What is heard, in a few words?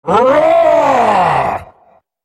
spell cast effort, en US; dandychogath; Character Voices - English